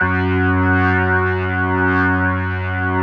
F#2S, B3, Vintage Keyboards

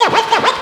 SCRATCH, Underground Hip Hop; USB Soundscan vol.02; AKAI; AMB.FX

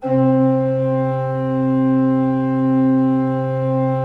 C3, Cathedral Organ; ILIO; Propeller Islands

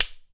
PAN CLAPPE, KOREAN DRUMS; AKAI S6000 CD-ROM; WorldSounds

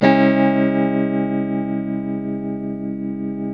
TELTMDMIN D, TELE CHORDS, Guitars; Spectrasonics; Hans Zimmer Guitars VOL-1

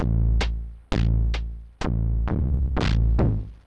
Octave Down Loop 130BPM, DrumLoop Ghost Layers

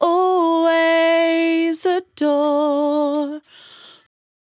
100bpm Bm, Velvet Vocal; Zero-G

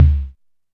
tom lo, Alesis DM5

